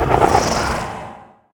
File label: chariot horse breathe; smite; Apollo